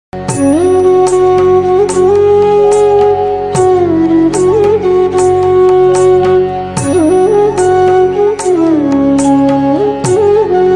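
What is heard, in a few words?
flute music; harivanasanam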